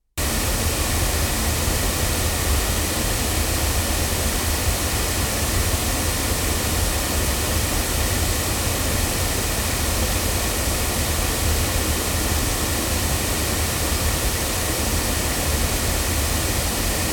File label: ADK Vienna loudspeakers, microphones recordings